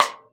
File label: RAW KIT RIM, Drums Acoustic; AKAI; 90s sample CD; USB Soundscan vol.10